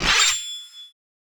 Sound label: Sword Pull; soundscrate